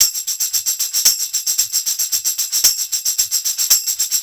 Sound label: TAMB, Roots Disco; USB Soundscan vol.32; AKAI